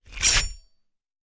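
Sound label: Knife Bayonnet; Sound Ideas; Guns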